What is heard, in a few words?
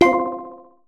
ui pause button; Kalulu